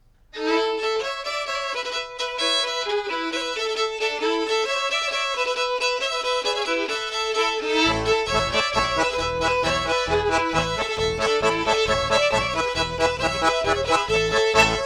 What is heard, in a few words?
polka